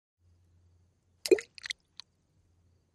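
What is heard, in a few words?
Splash Sml Pebble Plop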